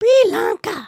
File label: sway, emotes